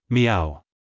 meow

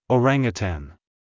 orangutan

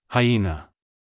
hyena; 英語で動物の名前を言えるようになろう

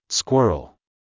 squirrel

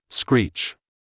screech

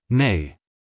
neigh

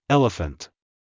elephant

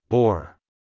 boar

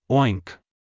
oink

